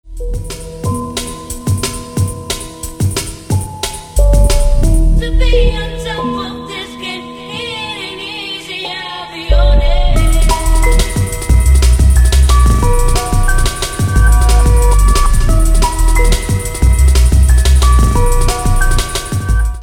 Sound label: drum bass mix